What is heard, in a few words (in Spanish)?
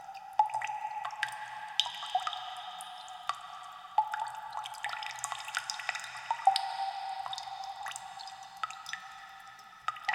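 Meditacion Sonidos de la Gruta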